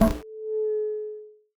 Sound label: Sound Powerup Megaphone Trigger